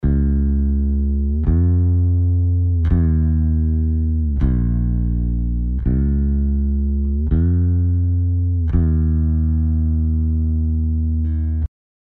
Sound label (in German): Bass Tonhöhe und Timing bearbeitet; Melodyne 5